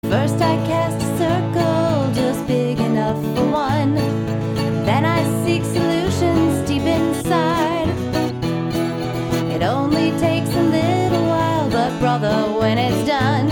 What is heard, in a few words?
meditation